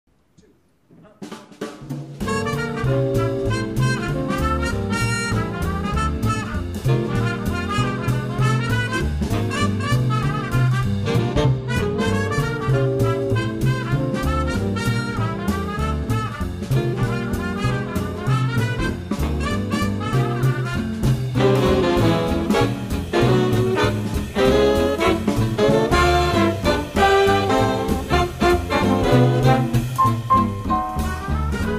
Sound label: BIG BAND